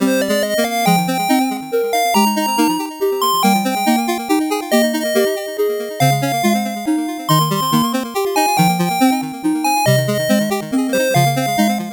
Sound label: 8bit bgm slow